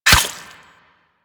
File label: Melee Dagger Impact Flesh